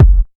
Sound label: raw bass drum single shot F sharp key, Royality free kick drum single hit tuned to the F# note; kick drum samples